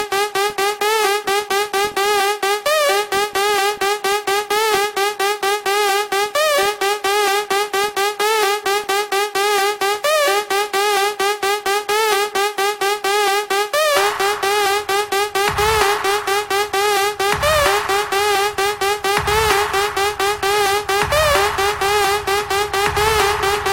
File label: electronica mix